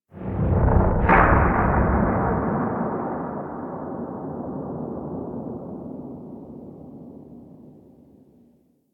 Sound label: Gas City Ext Distant Seismic Explosion; Ambience; Warframe; Corpus Gas City